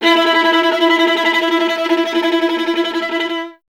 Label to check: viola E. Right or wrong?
Right